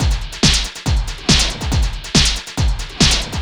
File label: Noisetech, Drums Beats